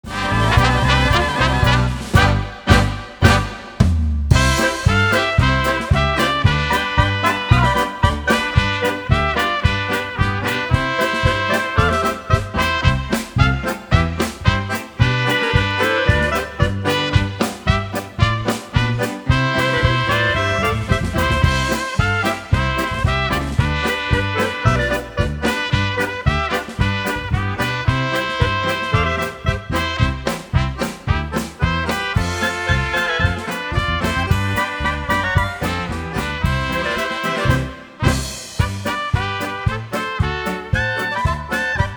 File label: Polka